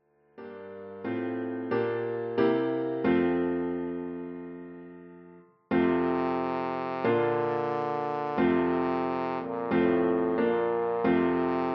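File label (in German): O du froehliche, Horn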